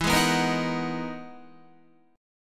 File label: E-Major Sharp 11th E, Guitar; strummed